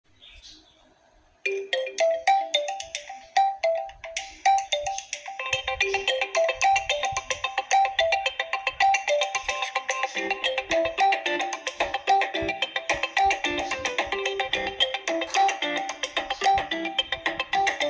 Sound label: Oppo Trinkets, zil sesi